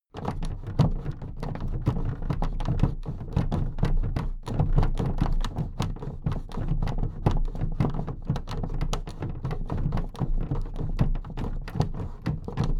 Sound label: big plastic case movement handle fast rumble